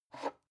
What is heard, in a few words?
glass pick up; objekte